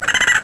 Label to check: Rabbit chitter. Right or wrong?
Right